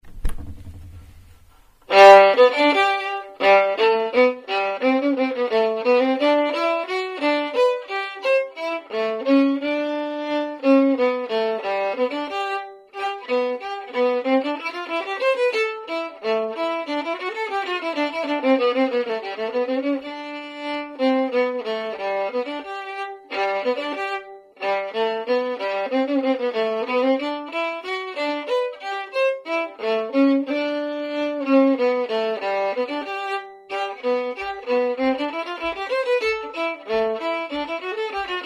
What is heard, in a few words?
Sailors Hornpipe Harmony fast, violin; duets for violin only